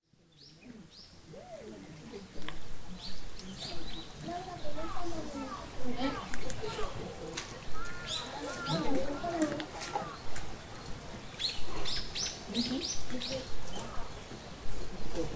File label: sakura hanami soundscape